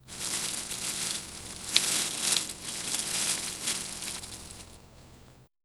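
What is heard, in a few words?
snapping twigs and rustling; branches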